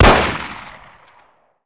S&W; Mafie 1

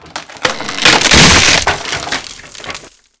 creak; Mafie 1

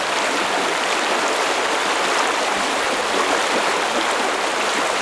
stream; Mafie 1

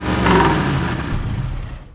bug off; Mafie 1

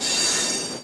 vagon; Mafie 1

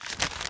menu open; Mafie 1

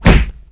body; Mafie 1

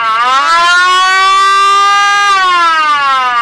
sirene; Mafie 1

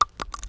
sand; Mafie 1

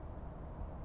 ambi in; Mafie 1

